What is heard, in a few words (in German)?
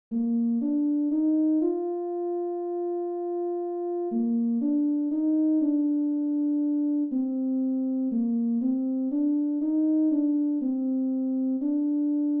tenor